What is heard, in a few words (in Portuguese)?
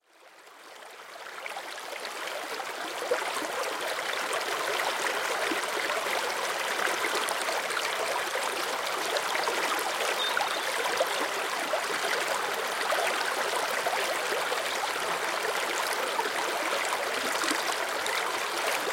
Campo Grande Ribeiro do Pego